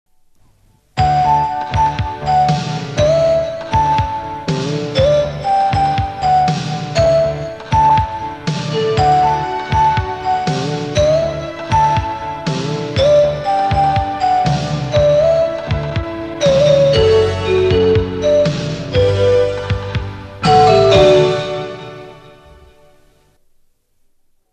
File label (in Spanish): La Música de tu vida instrum; Cadena Catalana